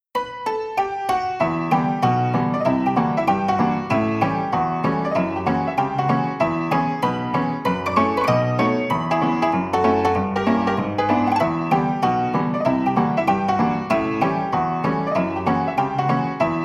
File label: Rehearsal